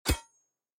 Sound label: sword Stone